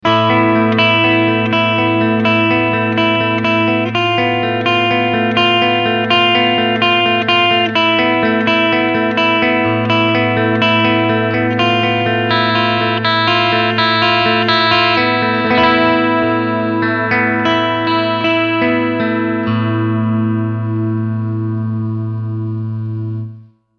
Clean Picking beide SC Mode

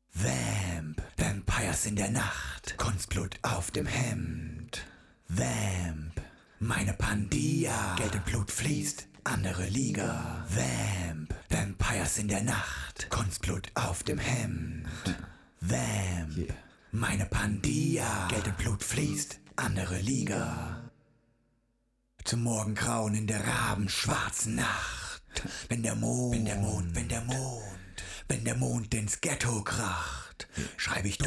Vocals trocken; Tverb

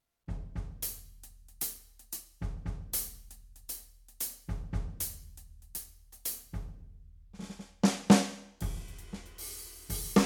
YAMAHA DTX8K M Old Kit Dynamik

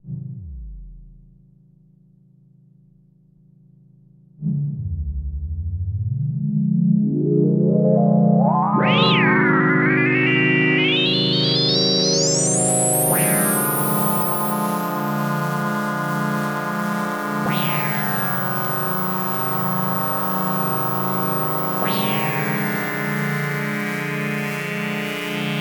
OB6 P6 Vergleich Saw Filter Res

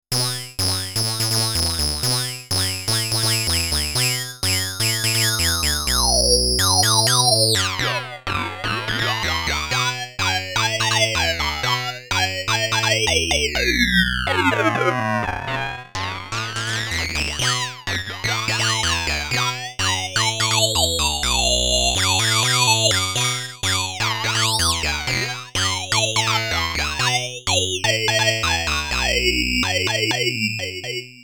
VCA Amplitudenmodulation; DSM01

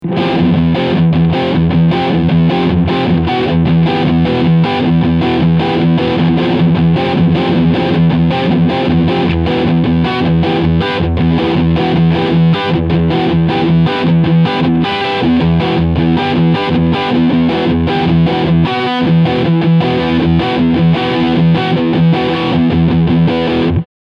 Riff Steg Humbucker

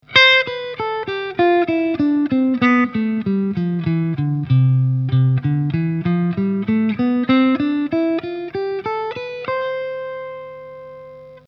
äolisch C Dur

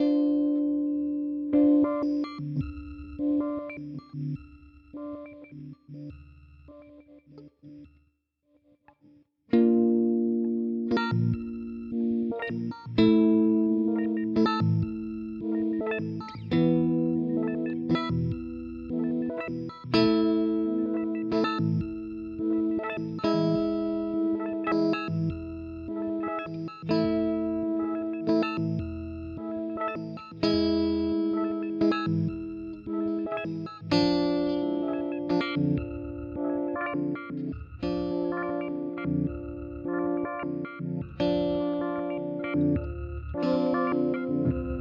Chase Bliss Audio Thermae Step Intervalls

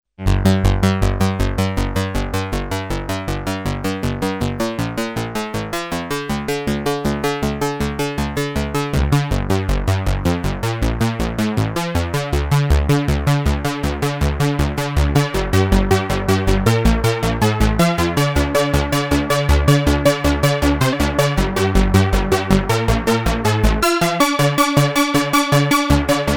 Unison Bass mit zunehmendem Detune; P600 gligli

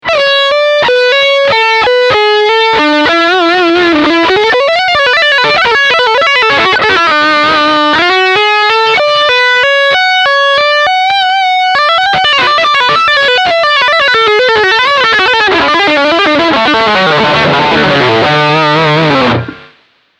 Leadline Steg Humbucker

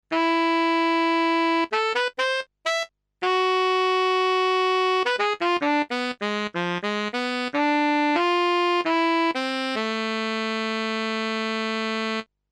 Roland S-330 Alt Sax

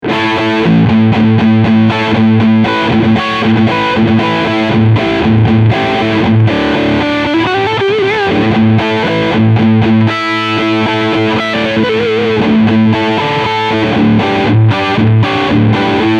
Riffsound Steg HB

